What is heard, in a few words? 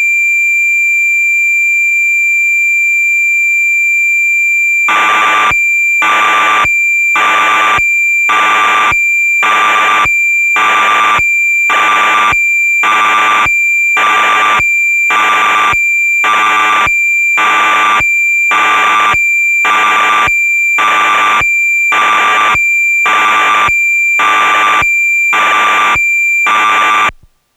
Yamaha DX9 factory presets keyboard, Cassette Interface; Synthesizer Factory Patches